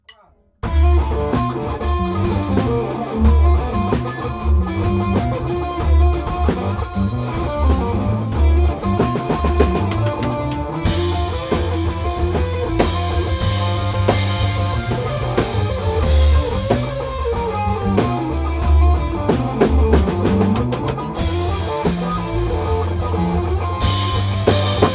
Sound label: Echoes Guitar Gone Wild